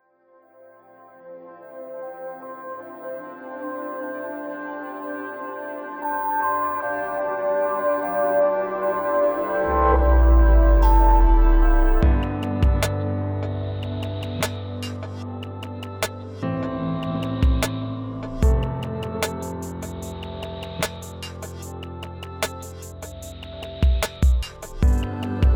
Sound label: Ambient Minimalism; Big Fish